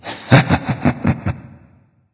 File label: "heh heh"; smite; voicepack; ullr